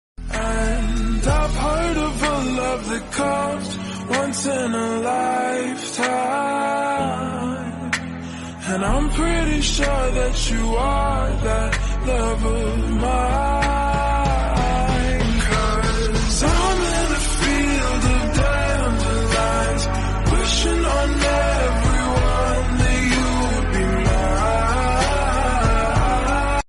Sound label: lofi english song